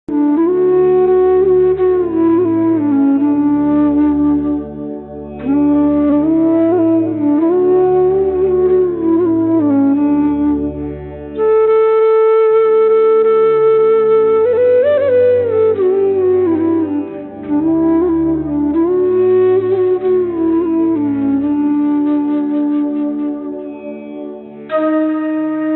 instrumental ringtone, krishna flute ringtone; tik tok famous ringtones

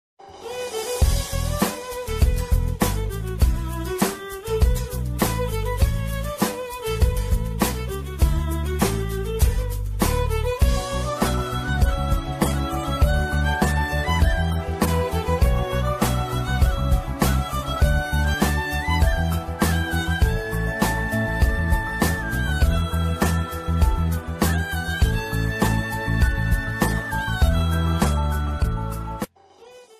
bgm

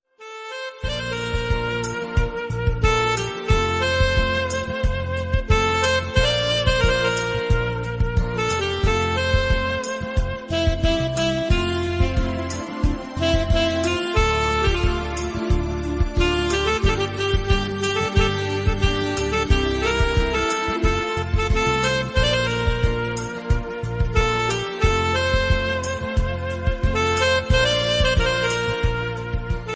instrumental ringtone romantic ringtone

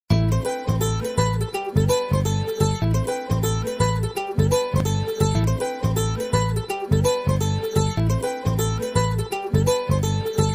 instrumental ringtone punjabi song

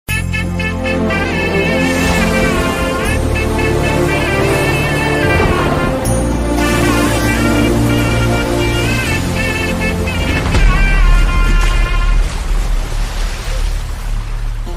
sad bgm sad bgm ringtone telugu ringtones; uppena

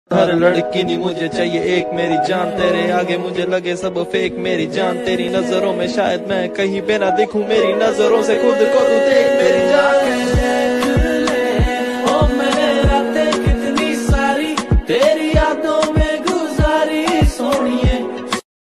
lofi ringtone

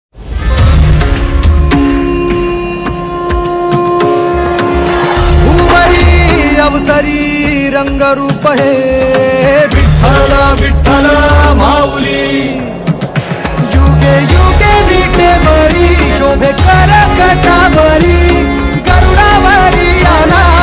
marathi bhajan ringtone; devachi ringtone; vitthalchi ringtone; vithu mauli ringtone

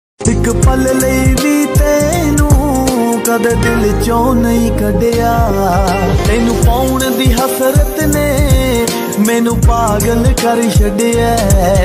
punjabi sad song

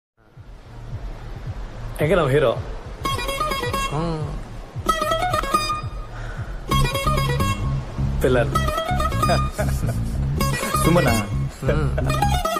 malayalam movie entry bgm; love action drama

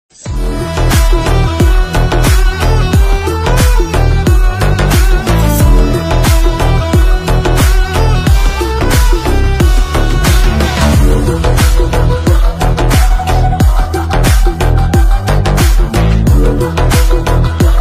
trailer bgm ringtone; Devara

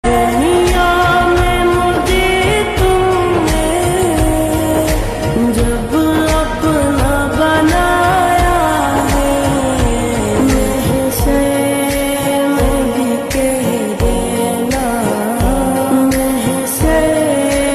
Islamic ringtone beautiful naat

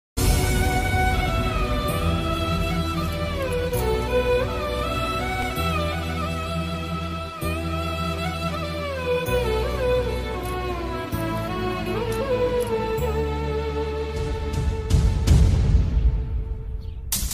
movie sad bgm ringtone; attarintiki daredi

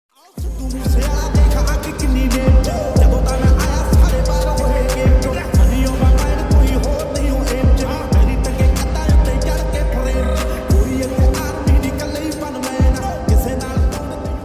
punjabi ringtone romantic ringtone bgm

